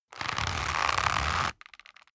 leather creak medium

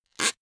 plastic pressured soft

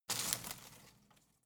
home plant leaves rustle regular

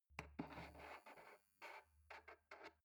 foot switch dimmer slow

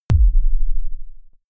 sub kick noise soft

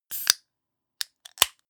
soda can open

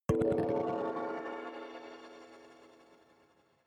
notification kalimba magical body hit

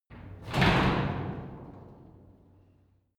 garage door close short hard

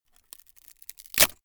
wood splinter

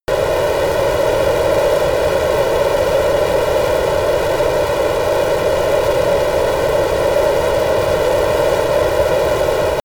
military jet f-22 raptor turbine full power close

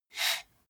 computer mouse draw short

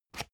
spring binder unlock

